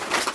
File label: firewind kite move